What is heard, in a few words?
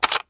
aug clip out, weapons